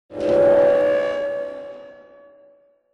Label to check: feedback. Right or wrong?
Right